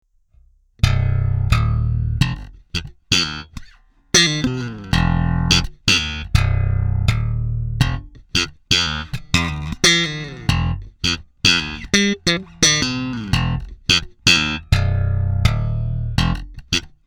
Sadowsky NYC5 aktiv slap beide pickups bass treble boost VTC voll auf

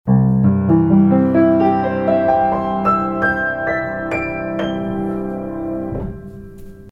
Piano, Roland R-05

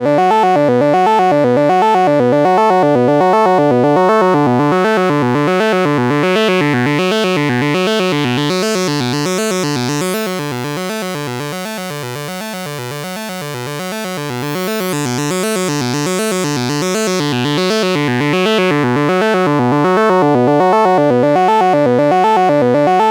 24db LOW PASS FM Sinus gleicher Tonhöhe moduliert Pulse Saw; GRP Module

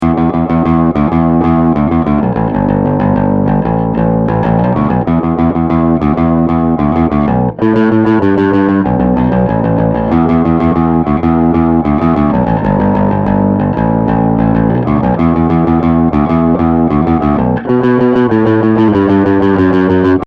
Bass TightDrive Plektrum; Amptweaker

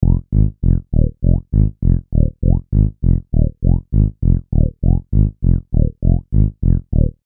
Bassmodulation

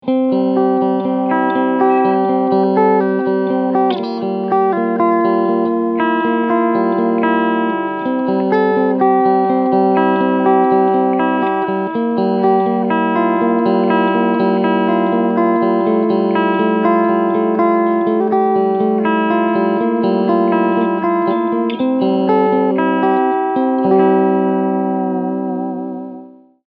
Clean StegMitte

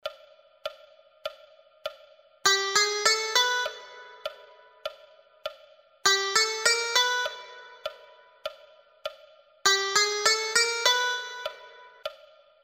SCALES Chromatische Umspielung

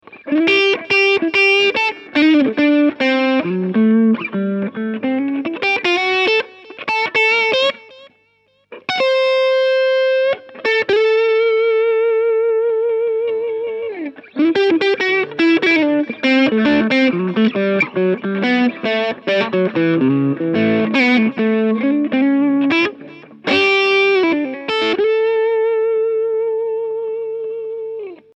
steg hals verzerrt

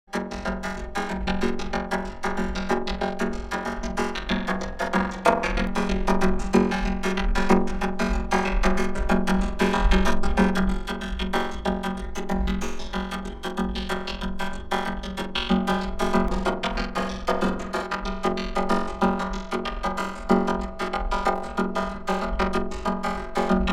beat roehre

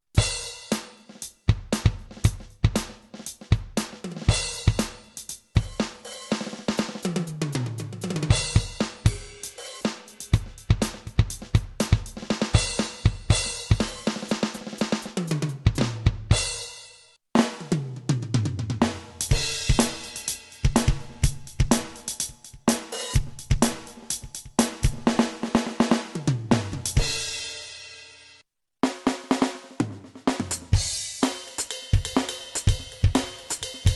Ak Drums; Alesis Test

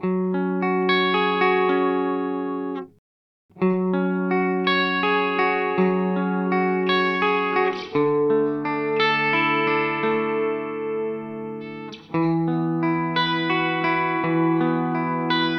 Earthquaker Devices Ledges Room alle Regler auf 3 Uhr

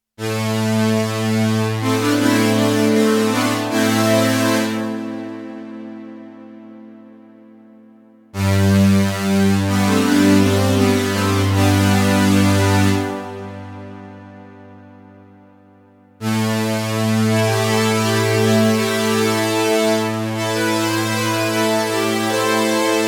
Strings mit Hall

